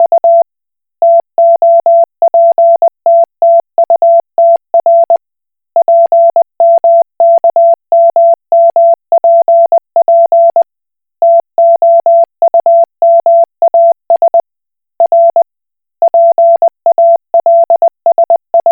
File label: Koch Morse